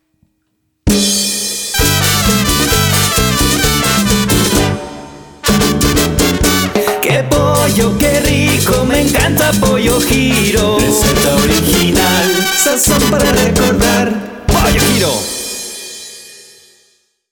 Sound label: JINGLE